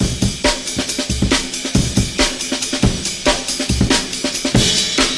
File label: amen break